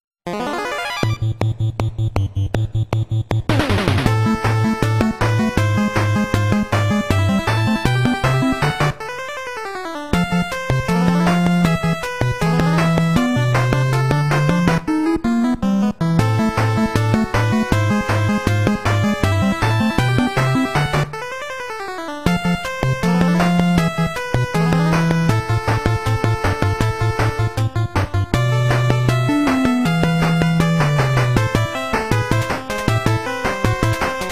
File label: battle fanfare; final fantasy iii ost